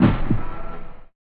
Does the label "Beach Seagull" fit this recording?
No